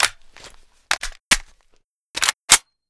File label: bar world reload